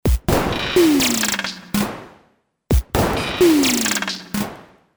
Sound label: Artiphon ORBA 2 All Out Drums